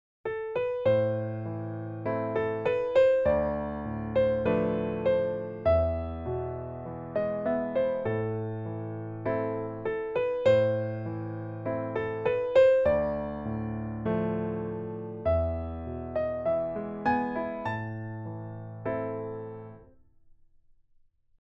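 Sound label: sad sad quiet beautiful piano background sound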